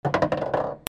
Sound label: BILLIARD